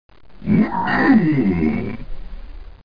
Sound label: MMMMM; How Many Bugs in a Box; juicebar